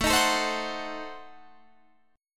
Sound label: A Diminished 7th A, Guitar Standard; 17,18,19,17,19,20; strummed; JGuitar